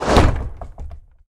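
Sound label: catapult throw; Egypt-Stone-War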